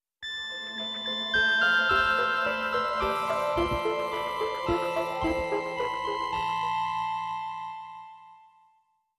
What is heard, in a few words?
Bleepy Carol Of The Bells